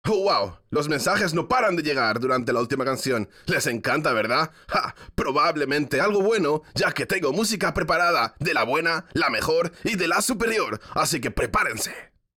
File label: Music Vehicle Radio Events